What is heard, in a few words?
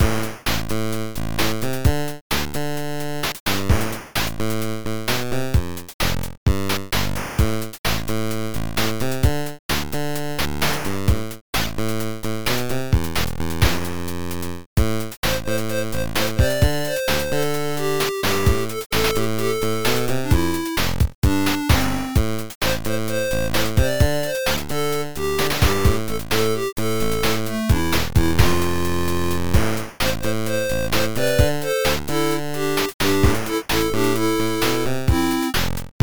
8Bit